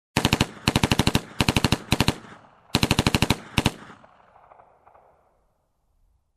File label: Machine gun shooting